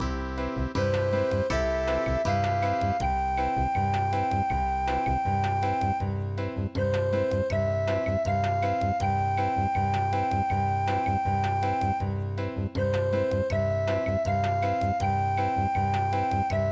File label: bossa; Saints